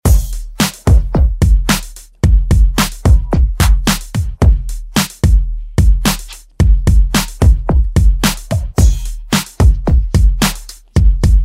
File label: KPOP Remix, 117bpm